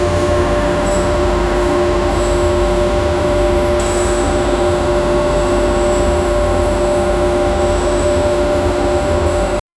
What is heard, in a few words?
industrial factory ambien